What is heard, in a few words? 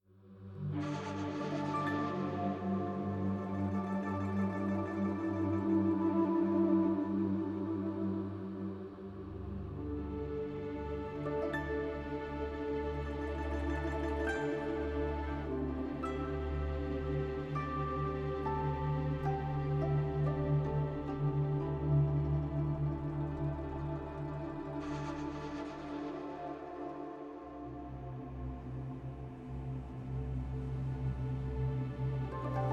ambient loop; unruly heroes